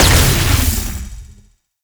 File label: Wave Ability Proj By Explosion; Enemies; Abilities; Warframe; Duviri; Dax Knight